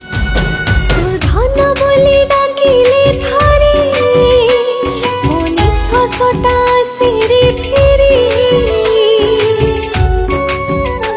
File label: Odia Sad Song